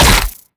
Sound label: bite, headcrab